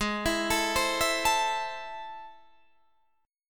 A Minor G sharp, Am/G# chord; arpeggiated; chordshape

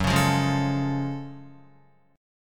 Gb Minor Major 9th, Guitar; strummed; 1,4,5,1,1,5; 4F,4A,5C,5G,5C,6E

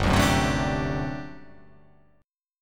G Major 7th Suspended 2nd Suspended 4th G down

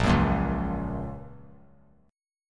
A sharp Minor Sharp 5th, down; strummed; JGuitar

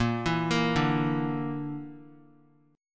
Bb Major Flat 5th, Guitar; x,1,4,3,2,x; 4F,4A,5C,5G,5C,6E; arpeggiated

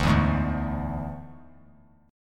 D Suspended 2nd Flat 5th D; 14,11,11,12; strummed

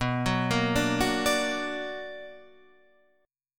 B Minor Major 7th, BmM7 chord; arpeggiated; JGuitar

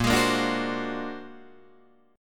Bb Augmented 9th Bb, Guitar Open D

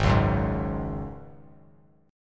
Ab 7th Suspended 4th Ab down; Ab7sus4 strummed

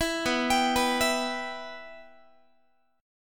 B Suspended 4th Sharp 5th B Guitar; Bsus4#5; arpeggiated; 19,19,22,21,20,x

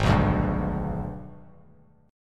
Ab Minor Major 7th Flat 5th Ab, Bass Standard; AbmM7b5; strummed

